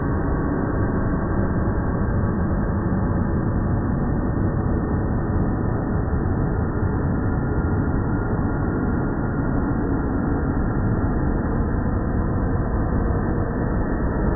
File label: AMB SCI-FI LOOP; gamerip; strike team gladius; ps4 ps5 switch windows xbox one